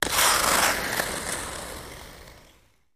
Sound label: Ice Skating Toepick, ice skating